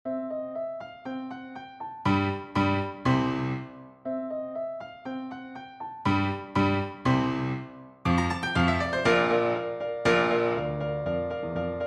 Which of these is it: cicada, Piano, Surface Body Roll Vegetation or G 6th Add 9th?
Piano